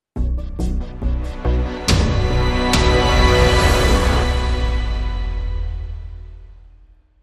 The Tension Builds Sting

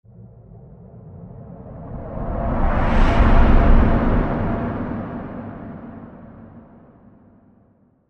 Mountain Breeze Windy Whoosh

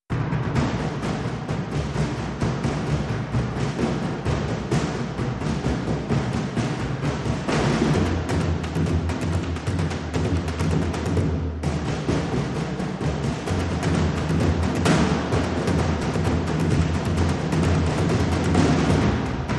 Loop Layer Dhol Ensemble

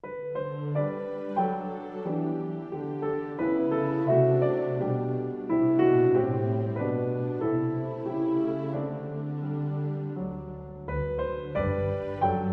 With Strings

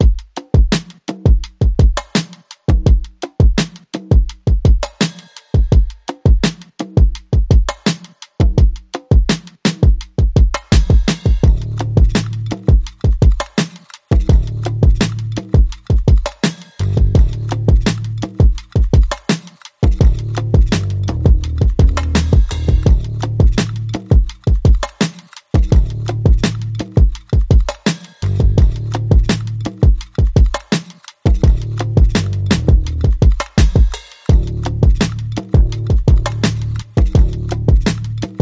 Drums and Bass Only

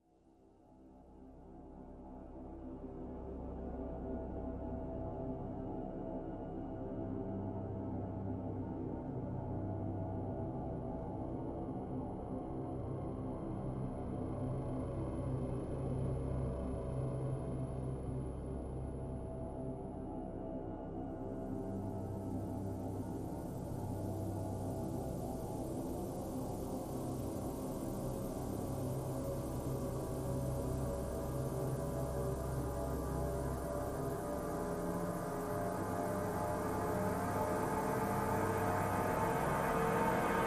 Drones

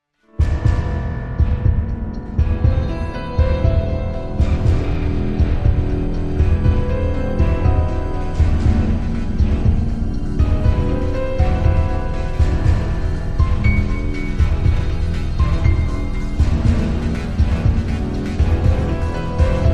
Building Sting

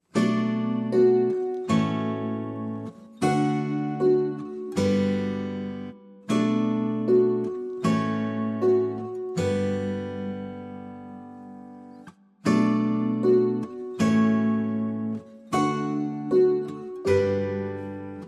No Percussion

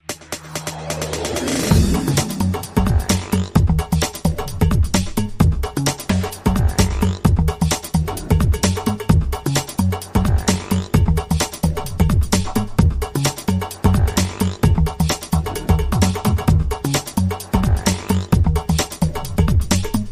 Jazzy Step

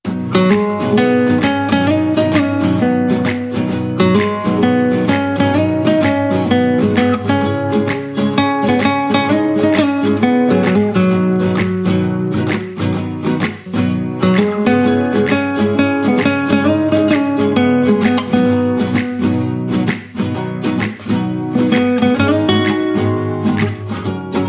Acoustic does it, Rhythm

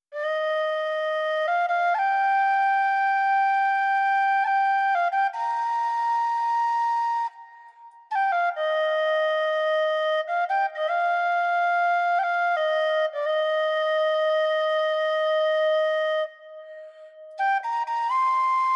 Whistle Solo

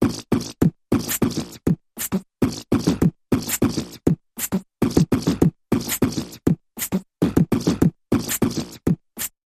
Big Mouth Percussion Variation

